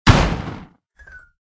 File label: Sulfur Alchemist Death; Dwarves